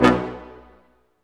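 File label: Large Ensemble, Brass Staccatos; Roland LCDP08 Symphony Orchestra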